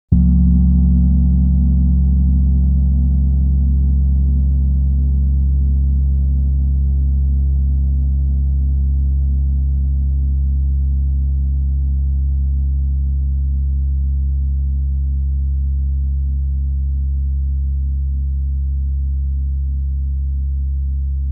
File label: Gongs, Erde 38 Center